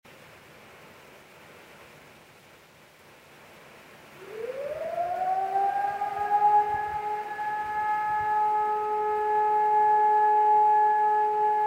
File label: heavy thunderstorm ambience with siren